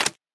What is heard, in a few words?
Button Esc